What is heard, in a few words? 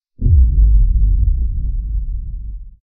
horror sound